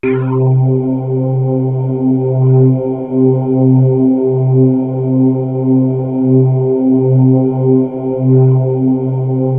VOX ORGAN; MORPHEUS; Syntec; Wall of Sounds

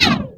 Korg, FX

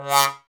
BRS BONEWA, Solo Tb mute; Roland L-CD702; Solo T.Bone

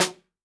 jungle snare chromatic, real drums

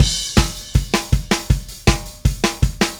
Happy Pub Beat Crash, Indie Rock; Sonic Foundry (Sony Creative Software); Indie Rock Anthems; Session 05 - Happy Pub

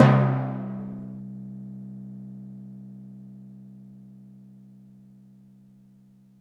Timpani, Roland JV-2080

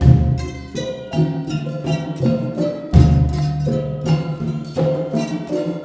MALAY L, Heart of Asia; Spectrasonics - Heart of Asia CD2

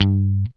GUI G2, E-GUI MUTED; Sound & Vision; Gigapack I CD 2

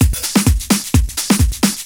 Latin Loop 128 BPM

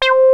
BASS, BASS STATION; Syntec; Wall of Sounds

